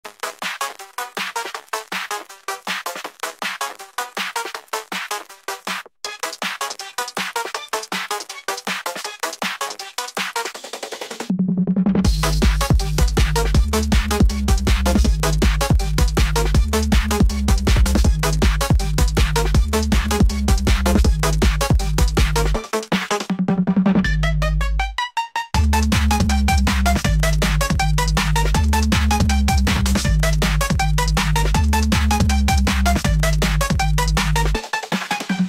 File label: aggressive phonk